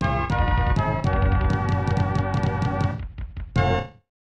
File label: Game Over; super scope 6; LaserBlazer